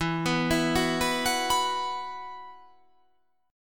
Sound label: E Suspended 2nd